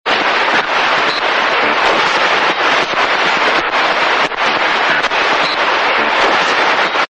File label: cb radio tuning static